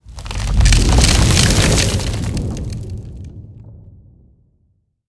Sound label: gate